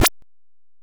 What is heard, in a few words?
door close; Station-0